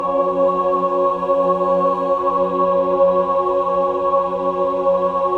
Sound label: SUS F#4, SUS.CHORD, Symphony of Voices